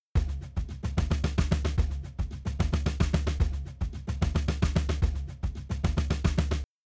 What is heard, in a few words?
Drums, shuffle drums; WDR2